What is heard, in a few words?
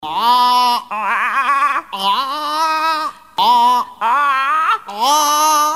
arrgh